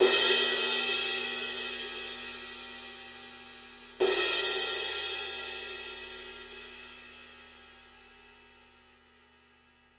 one cymbal

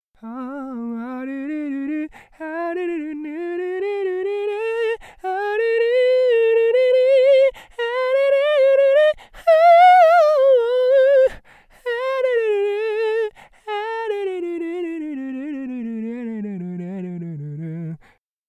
vocal

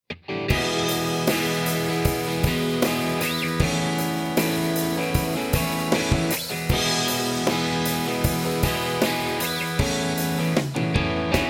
intro drum

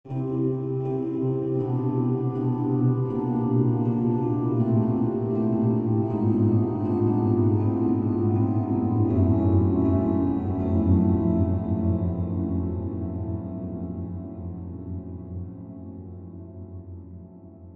large hall

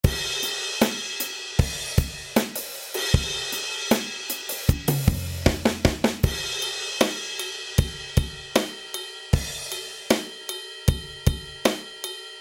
drums after

